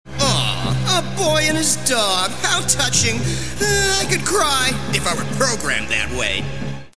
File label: cry cry cry; megaman